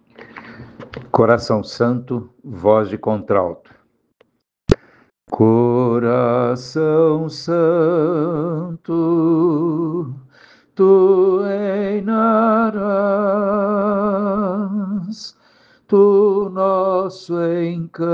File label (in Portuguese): coracao santo contralto; coral sao francisco; missa comemoracao sagrado coracao de jesus